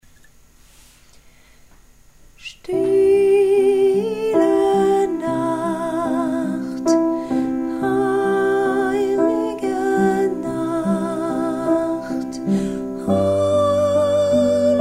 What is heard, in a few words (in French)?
douce nuit soprano couplet allemand